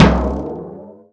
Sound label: Enemy Tower Falldown